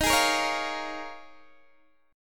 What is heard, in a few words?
D sharp 9th Suspended 4th D sharp, Guitar DADGAD